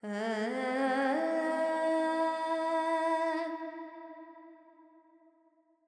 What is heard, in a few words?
Carnatic Female Alaps, Panthuvarali, 100 G, Verb